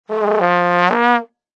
Cartoon Trombone, Pathetic, feeble, tentative horn noise.; Comedy/Cartoon; Funny Sounds; Lynne Music